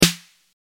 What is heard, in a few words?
Snare